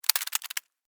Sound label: Insect, Movement, Shake, Bug; Animals:Insects